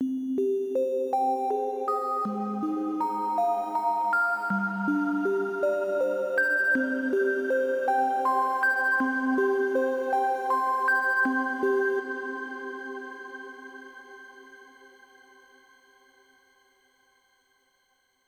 Overlay Tone; Savant Extraordinaire; Mirror's Edge Catalyst

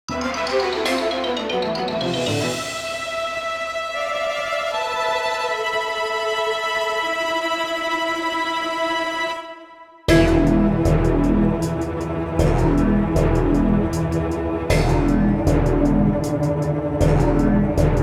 Defeat; Yokai Watch Sangokushi

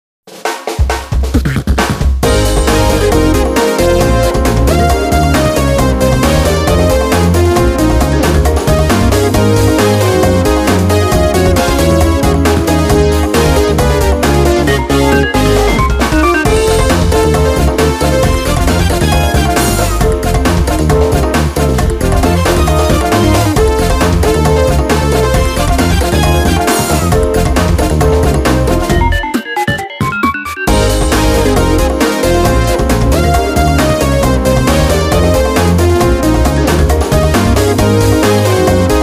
Music Success event

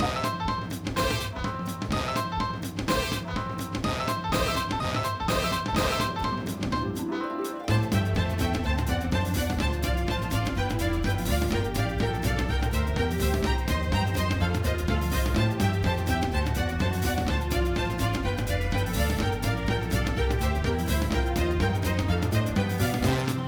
Forest Groove

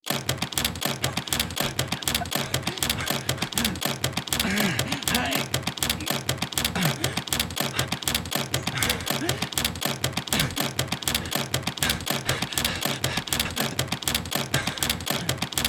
Minigame Attack

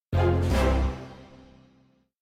battle out; Dungeon Crawlers Metal

